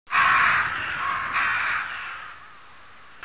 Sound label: raven; brainbread; cemeterytrouble